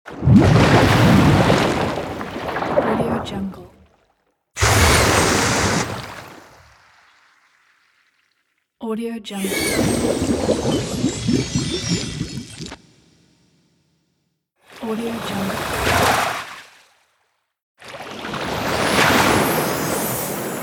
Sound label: water spell set